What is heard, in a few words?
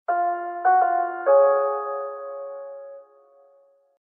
BAA Sound - Jingle